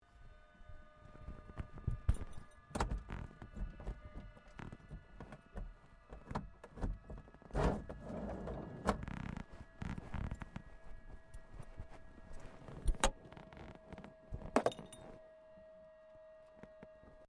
Aircraft Yakovlev Yak-52 Pilot Exit Interior, Russian Yak52 Training aircraft